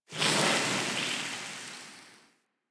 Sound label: Galleon Pipe Sizzle Amb; Ambience; Warframe; Grineer Galleon